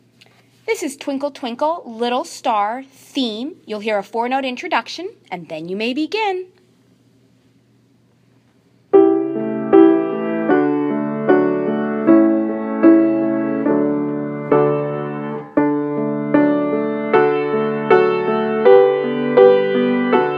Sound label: Twinkle Theme practice Tempo